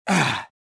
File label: land; Star Wars Jedi Knight II Jedi Outcast; XBOX; bespincop